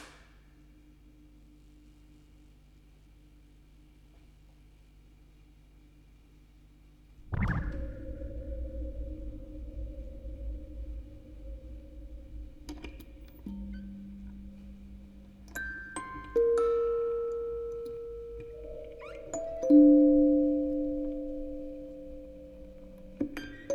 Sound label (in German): Aufnahme 14 Kanal stereo, Spieluhren-Quartett mit Tonband, Vocoder und Fieldrecording